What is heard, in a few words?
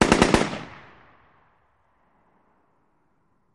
Machine Gun Sngl Burst; bursts